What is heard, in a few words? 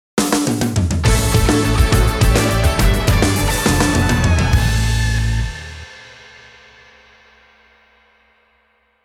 Power Activation; Advance Wars 1+2 Re-Boot Camp; Drake's